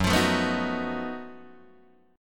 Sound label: Gb Minor Major 13th Gb down Guitar DADGAD